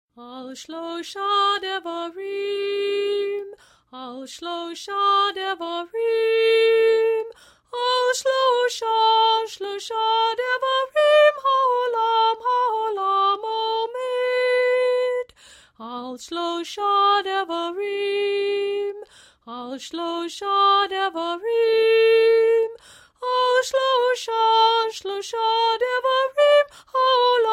sung

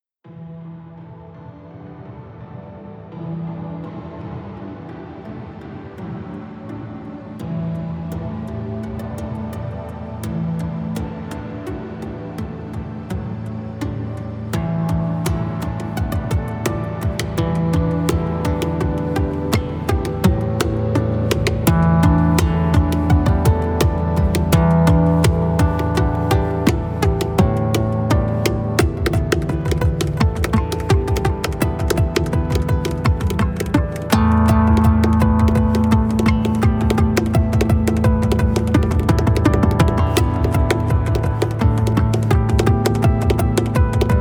Earth chime

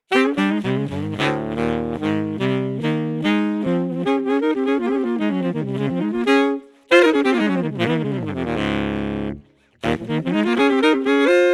Tracking Speed Whammy; Saxophone; Digitech Whammy V

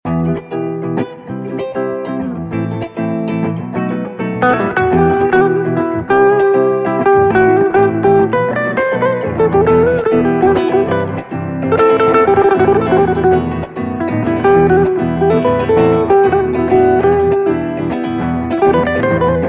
Rumba in E Minor

